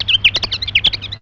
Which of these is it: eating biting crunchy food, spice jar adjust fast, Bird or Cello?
Bird